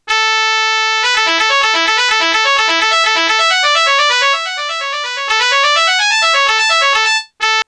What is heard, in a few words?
trumpet